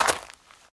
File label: walk gravel